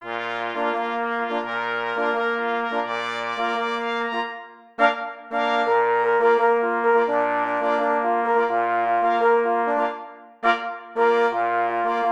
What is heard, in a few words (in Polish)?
Szczekanie starego kozla 2 plesy i 2 parforsy